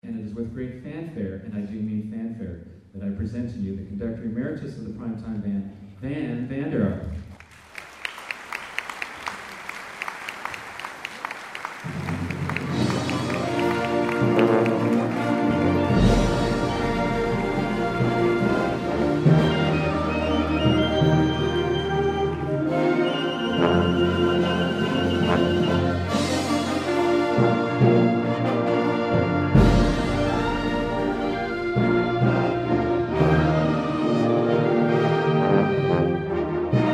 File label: Introduction and Fanfare